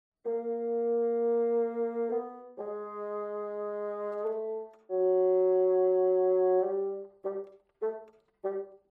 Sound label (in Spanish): fagot